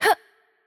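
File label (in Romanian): Attack Light, Romanian; VO; bloom minigame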